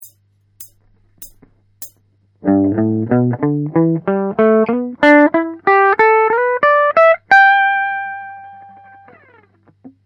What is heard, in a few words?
blues